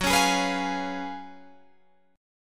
F sharp Major 7th Suspended 2nd Suspended 4th, down, Guitar, Drop D